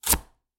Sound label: Cutting Board Vegetable Chop; soundscrate